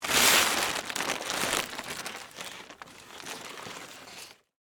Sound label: Plastic Tarp Down, Foley, Plastic, Tarp, Set, Down; Materials